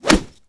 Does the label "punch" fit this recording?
Yes